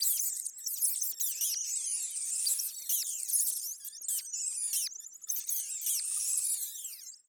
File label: Rats Squeak Group